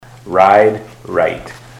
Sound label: ride right